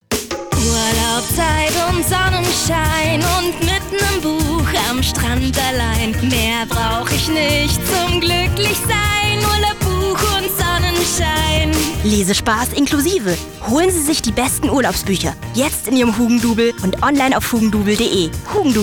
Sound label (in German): Gesang